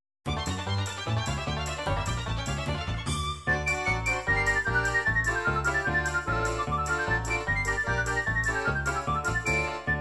russian ukrainian, midi

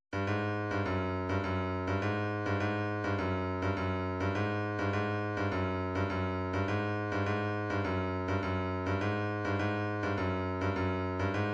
130 tambourine, midi

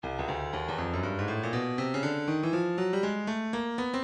breakbeat midi files 120 121 bpm 120; Jiggle C; Stylus Breakbeat Midi Files

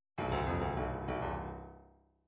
midi fills rock

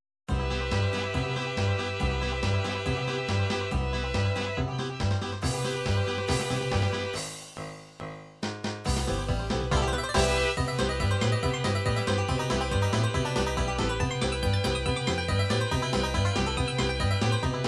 western, midi

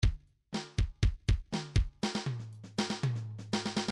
midi fills rock

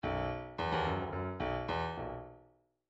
bdf midi file grooves rock f rock f

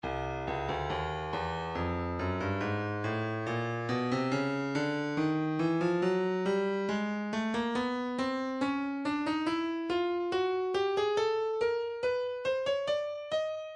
breakbeat midi 070 bpm kick; intimate moment; Stylus Breakbeat Midi Files

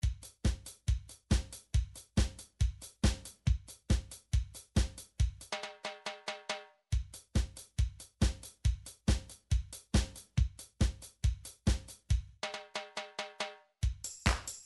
drum midi files disco

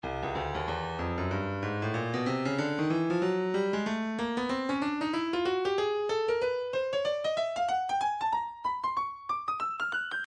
stylus breakbeat midi 094 bpm; Rimrod

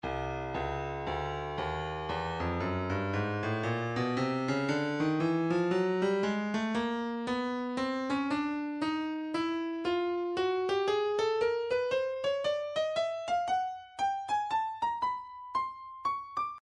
breakbeat 058 bpm; Stylus Breakbeat Midi Files; Wallfly B

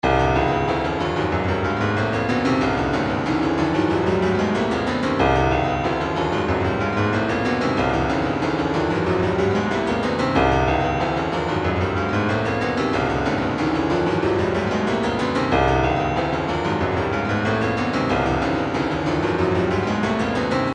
retro funk 093 drums

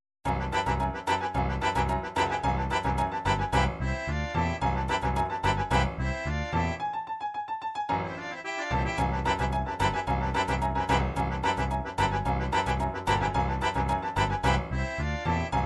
forro, midi

